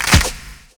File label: SOME CLAP; Univers Sons - Kitch 70s